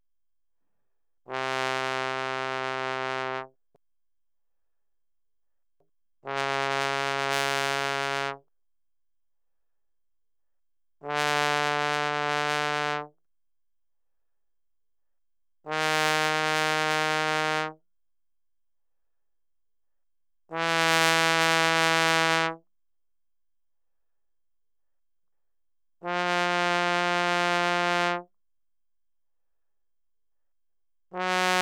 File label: Bass Trombone ff C3 B3; Brass